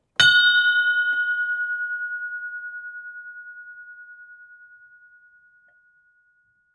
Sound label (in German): Glocke; SPD